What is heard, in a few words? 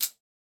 handgun out bullets